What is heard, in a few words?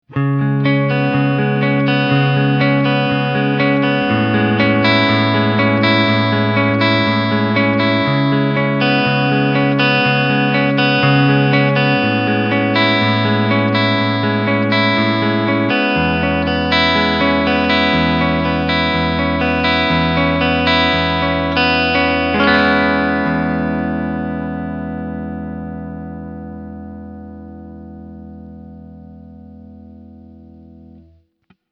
gretsch g5623 cb